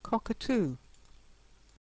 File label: cockatoo